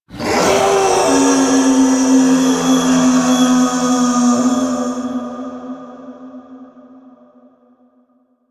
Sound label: Howl; Warframe; Wolf Of Saturn Six; Episode Five; Cinematics